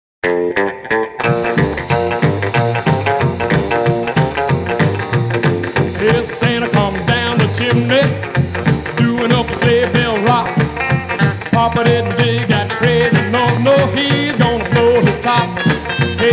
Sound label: Sleigh Bell Rock